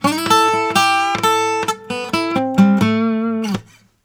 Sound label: Blues Guitar; ILIO; Fretworks; LICK